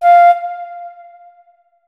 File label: flute notes; Hideout Furniture